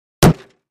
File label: Plastic Car Door Hit